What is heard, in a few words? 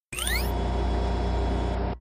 pop in